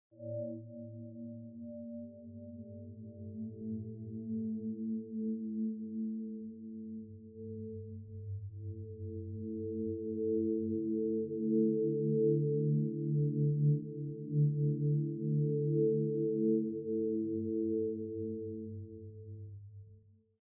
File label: choice musik; Life is Strange 2; gamesoundopedia